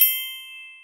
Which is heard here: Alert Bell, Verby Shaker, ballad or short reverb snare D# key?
Alert Bell